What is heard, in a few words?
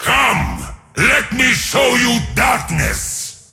attack; Aatrox